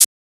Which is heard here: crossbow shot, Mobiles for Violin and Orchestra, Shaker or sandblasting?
Shaker